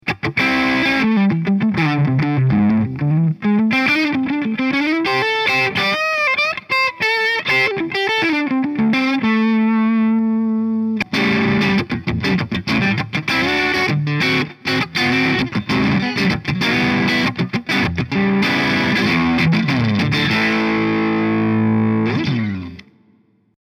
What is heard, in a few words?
crunch